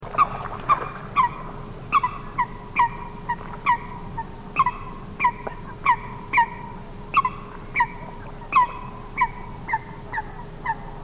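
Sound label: coot, birds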